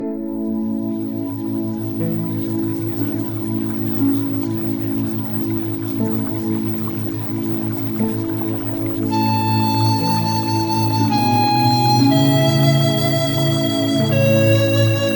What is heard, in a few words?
Music Theta Waves; Premium Subliminals